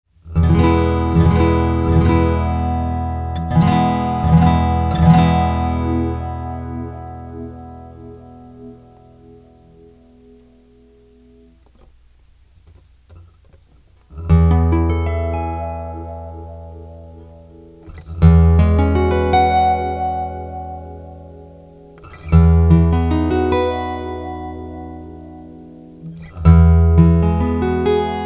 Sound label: pickup wah, amp; pickups